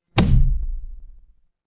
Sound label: transition impact hit